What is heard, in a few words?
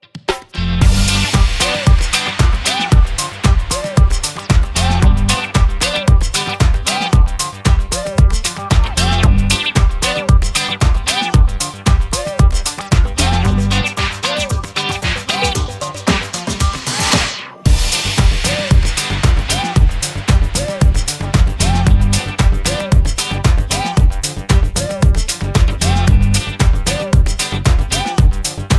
114 BPM Disco EDM; Yum Yum Cookstar; Disco EDM Alt